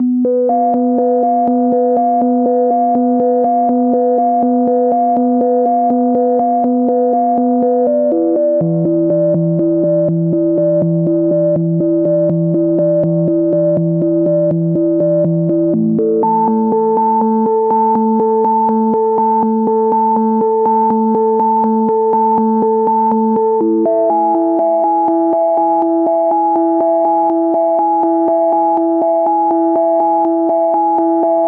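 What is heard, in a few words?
122 BPM Melodic Arps A major G minor Tonic D; MMB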